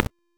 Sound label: Whip Whoosh; Warframe; Warframes; Khora